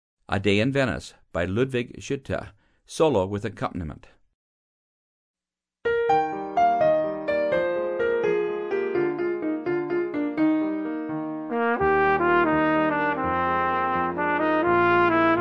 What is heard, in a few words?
Trumpet and Accompaniment; Grade 11 Solos